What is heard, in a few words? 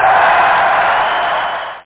CROWD; Internet 1996 World Exposition; Japan; Panasonic